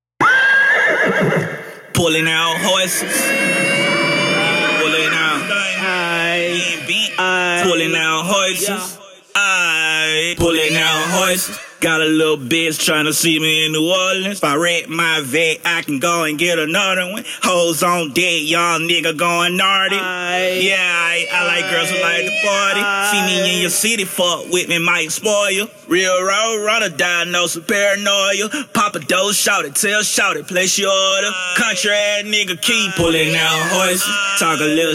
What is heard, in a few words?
ACAPELLA